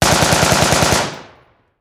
gun machine gun automatic 56 caliber m4a2 burst close perspective